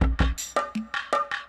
PERCLOOP ETHNO 160

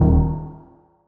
Bass, One Shots; Touch Loops; BlissedOutBeats

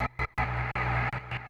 Industrial 160

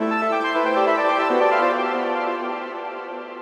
TECHNO EXTRA 140

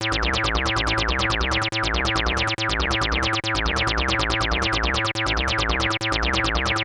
SPACE SYNTH 140 A; Keys; SpaceSounds

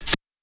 hat; Anthemic

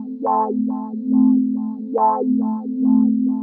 techno pad 140; Techno2; Keys

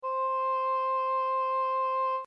Oboe; Real Samples; oboe&horh

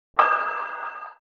HOUSE FX 125

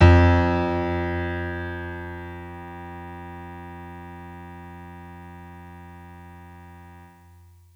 PIANO F3; Orchestral; FL Keys; Piano 1

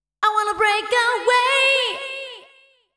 deep house female, 125, A, vocals

